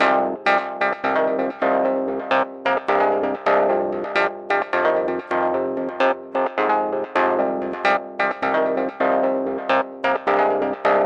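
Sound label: colorful echoes arpeggio lo, 130 bpm; SYNTH AND ELECTRONIC LOOPS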